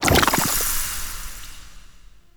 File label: Sap Crate Destroy; Neathuns